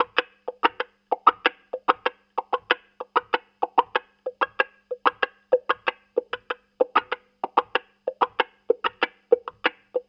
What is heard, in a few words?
95 Mute Wah Guitar